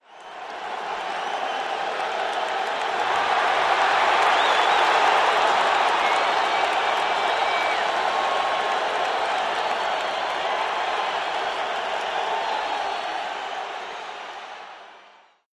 Large crowd yells, applauds and whistles during a pro football game in an outdoor stadium.; Cheer, Crowd Crowds, Stadium Football, Crowd